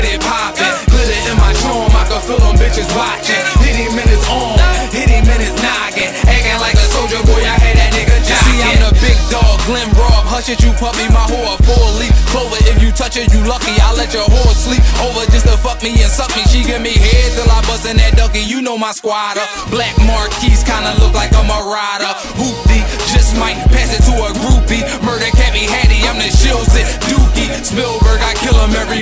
Scream

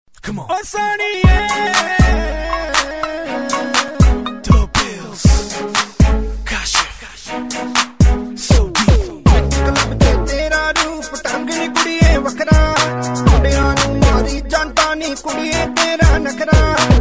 Bhangra

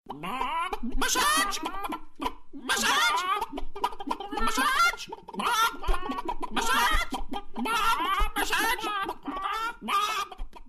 Chick Text

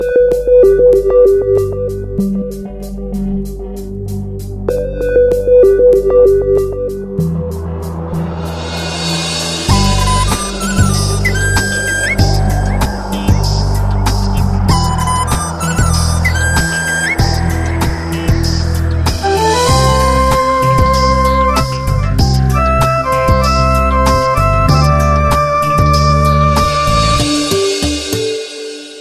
Romantic

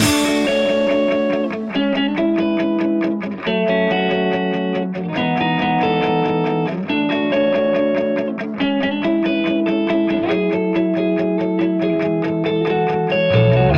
Guitar Riff

